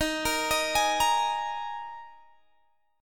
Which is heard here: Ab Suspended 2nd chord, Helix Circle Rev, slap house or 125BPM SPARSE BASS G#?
Ab Suspended 2nd chord